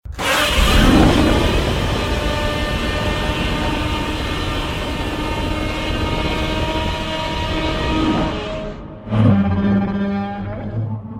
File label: roar; godzilla 2019